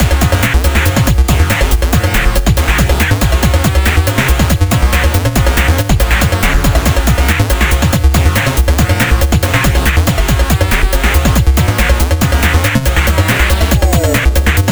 8bit Midboss; Radirgy 2

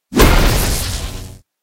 bubble hit; game rip; line bubble

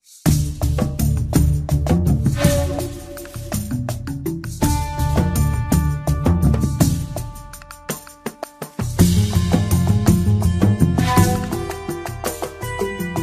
Boss Battle; Ratopia

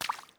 Foot Wet Puddle Run

